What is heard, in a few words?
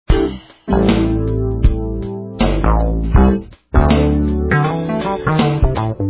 chillin loop